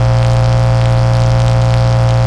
neonbuzz; Tony Hawk's Project 8; XBOX

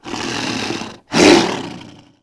Roar, Dino; Styrac

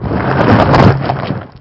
WORM; outcrop

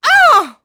get hit voice; UFC Tapout

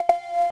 Button Press Success; interface

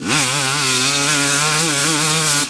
Power, Engine

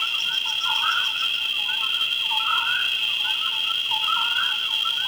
Rain

